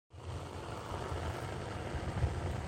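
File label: longboard wheels spinning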